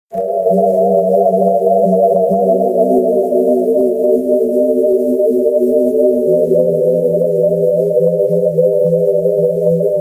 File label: pad chords